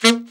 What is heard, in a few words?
ALTSAX D3; Best Service; Alpine Volksmusik